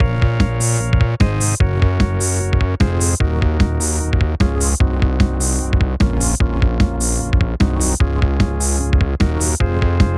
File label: USER BEAT